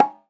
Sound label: Bongo; Vengeance Samples; VEH1 Percussion